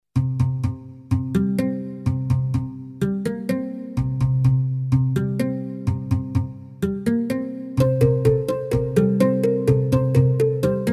FX sample and hold, Roland JD800

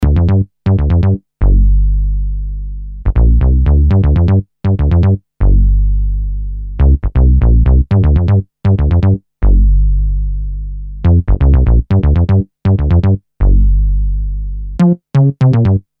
bass, Emu planet phatt

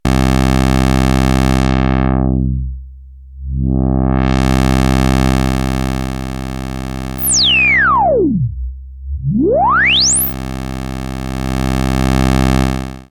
filter, minimax; Creamware minimax

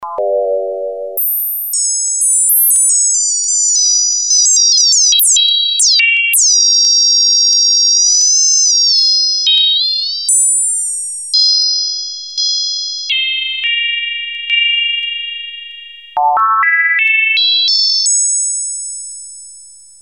sine wave sync mode, redsound darkstar; TEST: Sinewave + sync

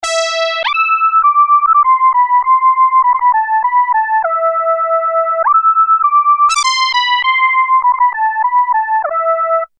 lead, Emu planet phatt

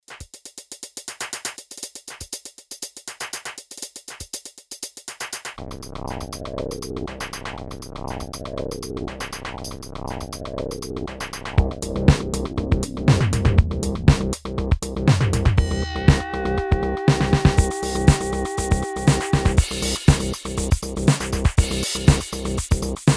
dmx bass station

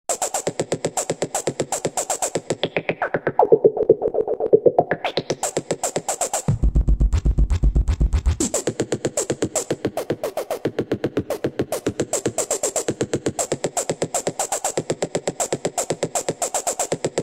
drum filtered, yamaha cs1x cs2x

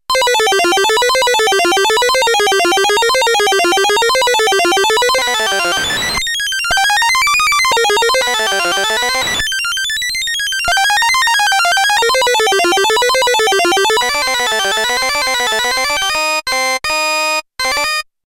beeper, nanozwerg; MFB nanozwerg

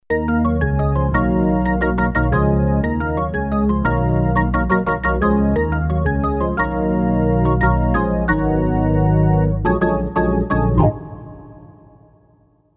korg m1r synth; organ: hammond b3; cpu NEC-V50 (part of 70216 family) manages 16 voices polyphony

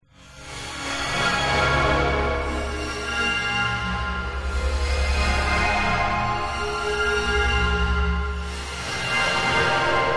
pad stereo, yamaha sy99 synth

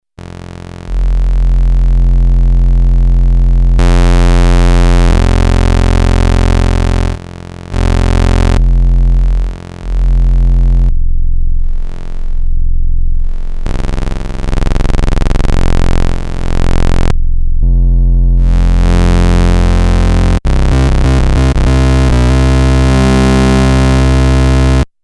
test sub, novation bass station 2

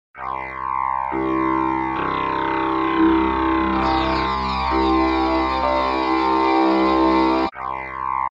synth formant; emu mo phatt

